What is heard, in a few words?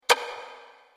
clock